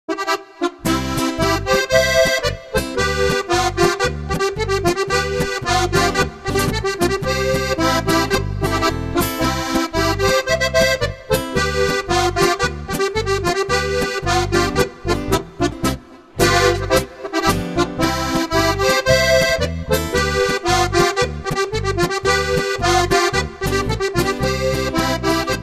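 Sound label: stoanriegler polka, Steirische Harmonika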